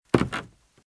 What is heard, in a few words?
Footsteps - Wood - Creaky - Walk - Left